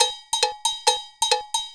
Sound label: Bell Riding, Percussion Loops; Drums